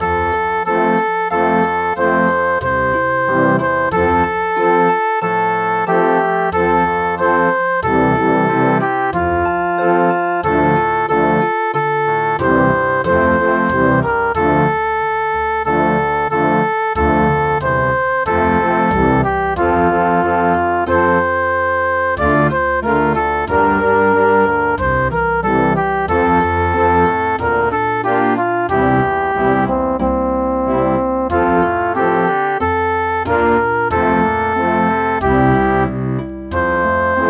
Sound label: vienna waltz; Angels Heard on High